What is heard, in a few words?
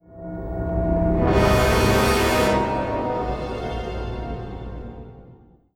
Stinger Dark; Pegasus